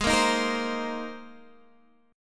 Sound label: Bb 9th Suspended 4th Ab, chord